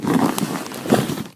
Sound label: Bag